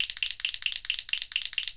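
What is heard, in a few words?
clacker double; ILIO; Synclavier Percussion 2; World & Orchestral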